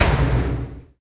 Sound Purgatory In cage; Peace, Death

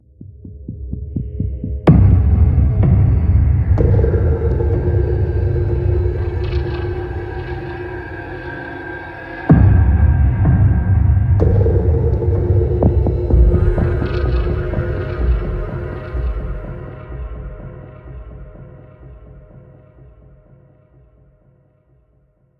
Sneak

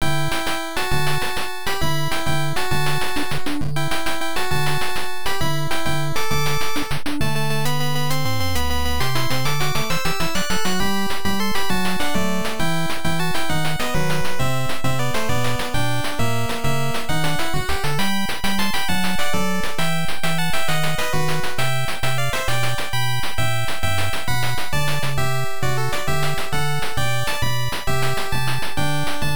Marching Raster; terra cresta nichibutsu gamerip famicom

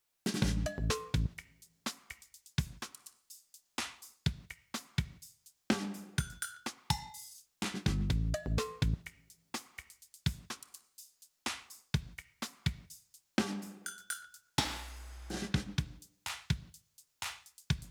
BGM Minigame Level select

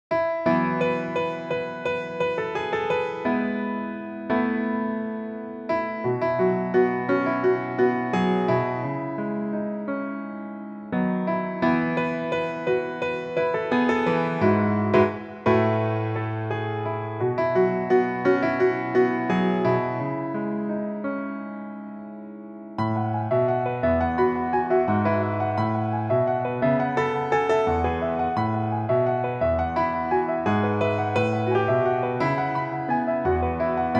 Piano Arrange

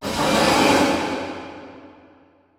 Sword Drag; silent hill homecoming 2008 gamerip pc ps3 x360

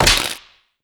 Panel Circuit Correct; Among Us

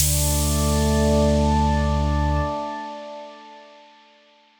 Retry Jingle; Friday Night Funkin' Funkin' Origins OST mod gamerip PC 2022

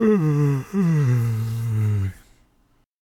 John Sleep

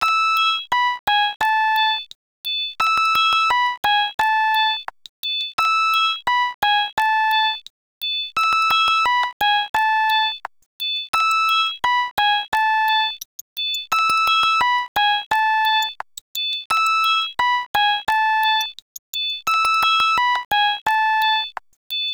BGM Remix; Puzz Loop; puzz loop 2 cp system ii arcade gamerip 2001

